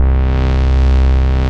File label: Classic Synth Bass; Instruments; Basses; Ableton; Creative Extensions